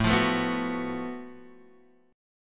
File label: Gb Minor Major 7th Double Flat 5th A